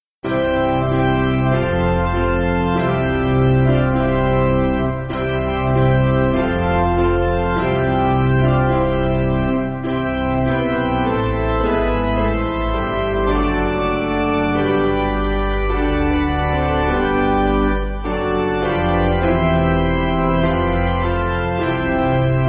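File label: Piano, Music Backing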